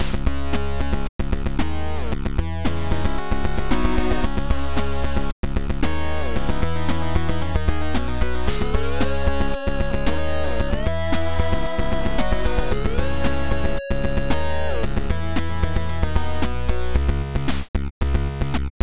boss battle

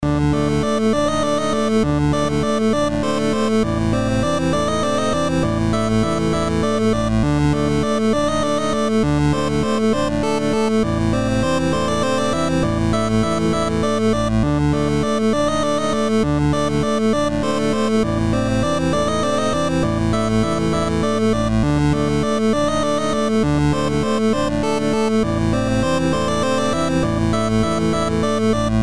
pirate

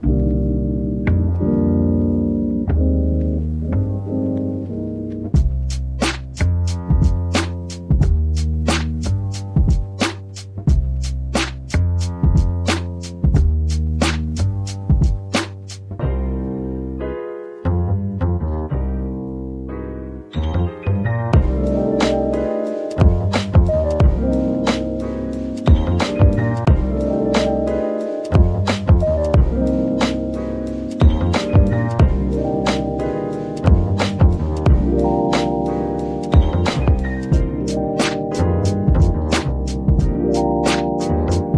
guitar

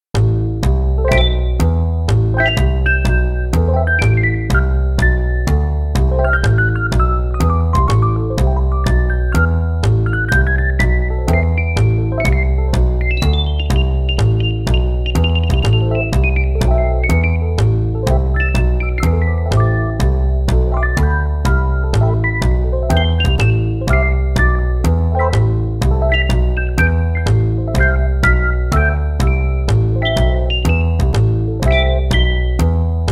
wild jazz

